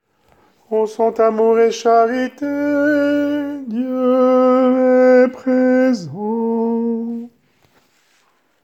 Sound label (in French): tenor